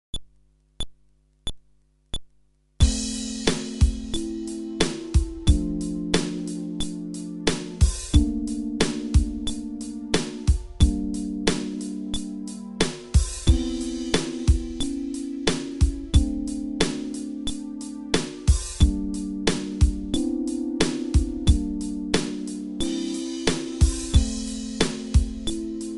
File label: Groove ベース無し